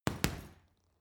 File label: boxing punching bag hit double creak; sport-freizeit; boxen